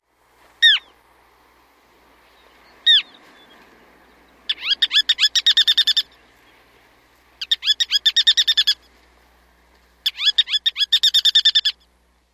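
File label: ruddy turnstone calls near nest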